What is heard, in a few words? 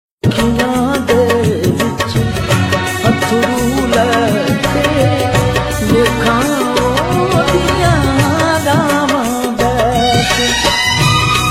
Heart Broken Punjabi Ringtone